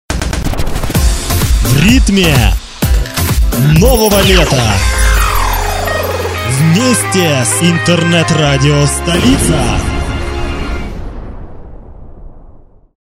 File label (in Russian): Джингл; 'Новое Лето'